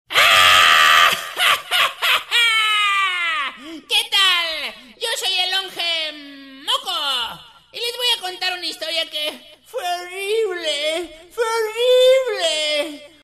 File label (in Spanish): riendo gracioso castellano tono de llamada